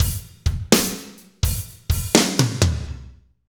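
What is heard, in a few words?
slow shuffle, Roland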